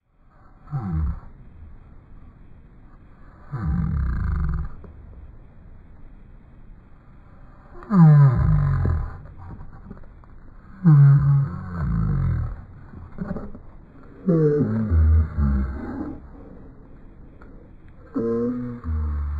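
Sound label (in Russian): я замедляю звуки собаки чтобы она звучала как киношный монстр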